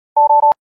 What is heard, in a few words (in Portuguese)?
erro